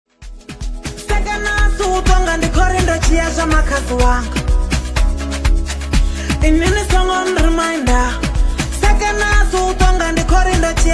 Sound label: Emotional Tribute song